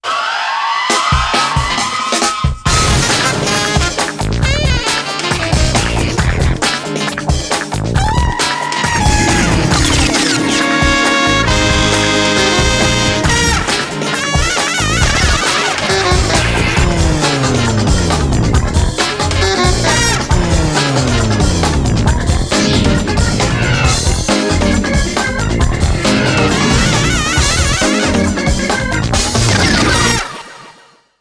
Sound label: Funky